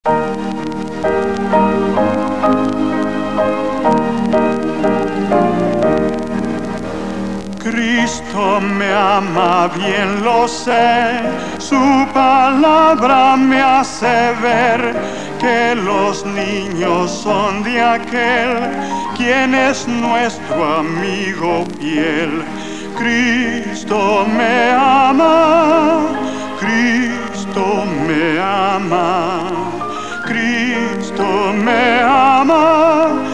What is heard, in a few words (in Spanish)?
melodia palabras; Cristo me ama